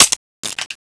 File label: dart pistol empty; cstrike